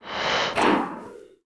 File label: Lizard Man Knight Idle; Seer; GamigoNA